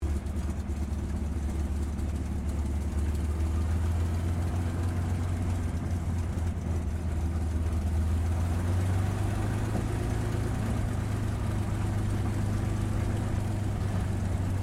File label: AIRCRAFT CAC CA-18 MUSTANG MK23 TAXI SHUTDOWN INTERIOR; CA-18 /P-51 Mustang; Airplanes; Vehicles; Aircraft Collection; Twistedtracks